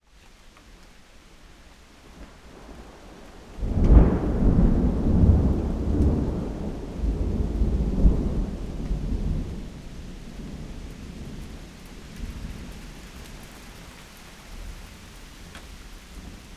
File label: Thunder Roll